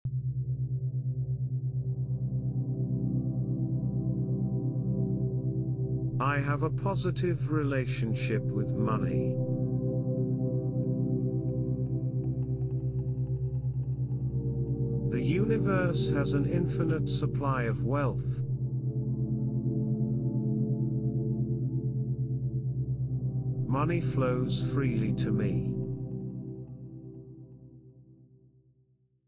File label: ambient; AmbientAlpha; C Wealth